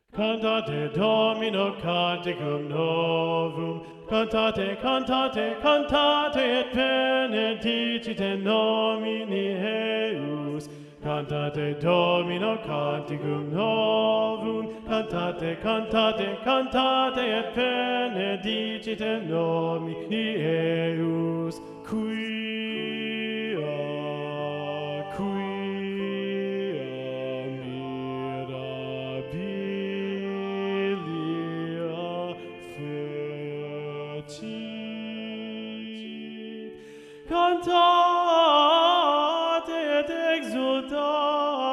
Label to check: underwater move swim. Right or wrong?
Wrong